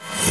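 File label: reverse Bash, Cinematic Metal Impacts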